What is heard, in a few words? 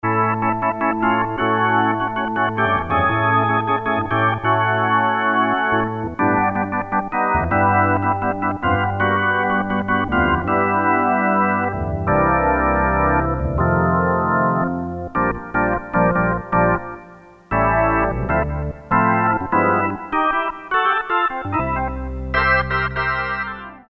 ORGAN, Korg DSS-1; Multiple Inst